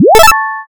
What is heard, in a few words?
boot